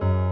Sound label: piano low